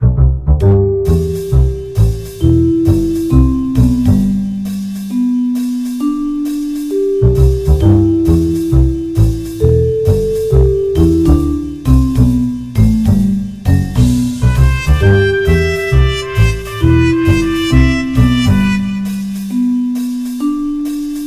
tv studio jazz; horace ps4 switch windows xbox one gamerip 2019

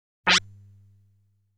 Bullet Scales Launch Phase; Dragon Breed

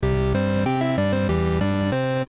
8bit song; the emerald maiden windows 2016

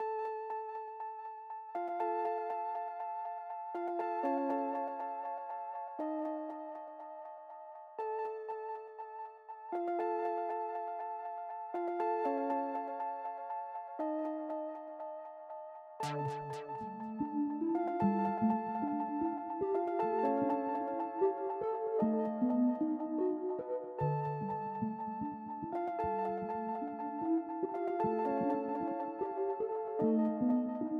Synths

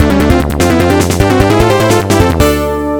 You Unlock A New Car; Asphalt Urban GT 2

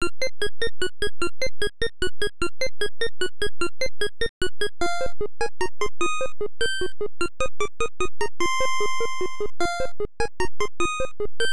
Special Room BGM; Babel no Tou; nes

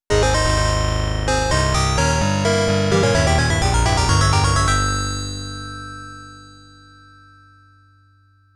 Lap Clear

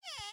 DOOR Cupboard Open; don t be afraid the first toy

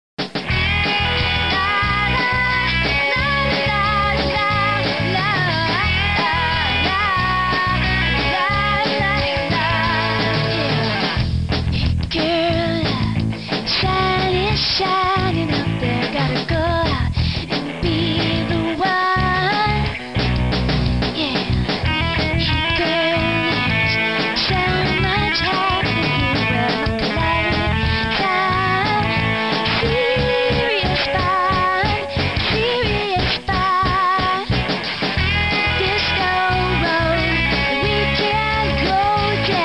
Serious Fun, Upbeat; Working Woman Barbie Windows gamerip 1999

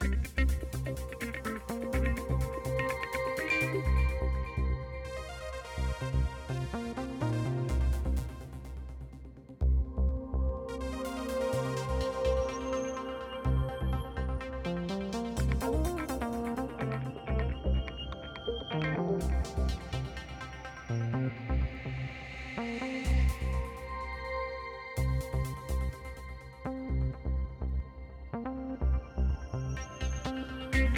Menu Music; buzz brain of the uk 2009 ps2

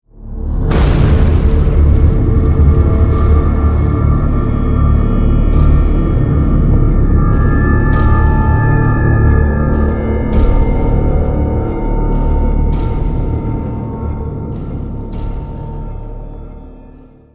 suspense panic; arcadia; Call of Duty Modern Warfare 2

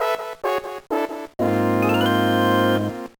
Jingle, gba; Dan Doh Xi

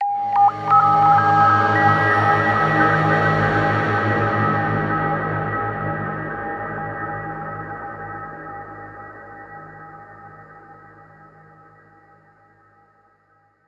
Industrial Field; soma gamerip 2015

